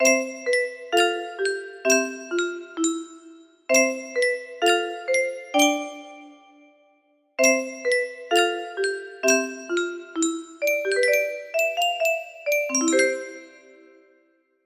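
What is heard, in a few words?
music box melody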